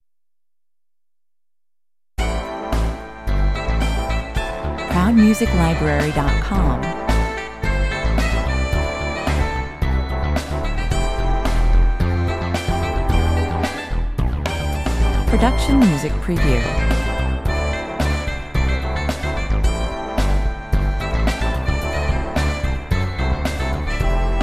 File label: saxy melody